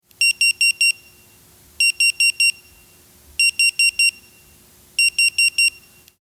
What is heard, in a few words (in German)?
Pieper